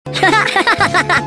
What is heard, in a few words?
laugh